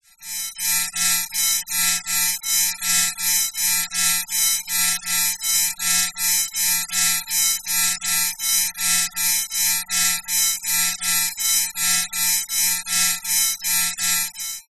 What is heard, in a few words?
sound design bright blinding light